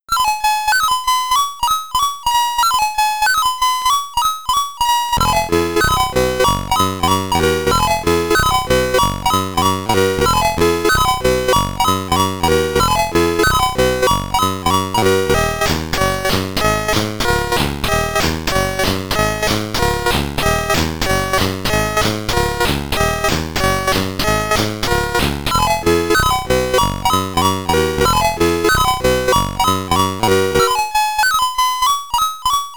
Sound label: SID Stereo, commodore 64; Wizardry The Edge; Spider Theme